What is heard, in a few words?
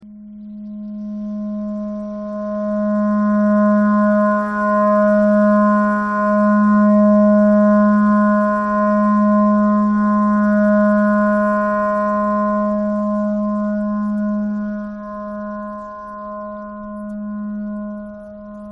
clarinet clarinet simple